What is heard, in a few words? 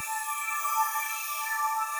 floating, upgrade; CrossCode